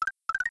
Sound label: egg hatching; smw